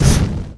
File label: flame thrower end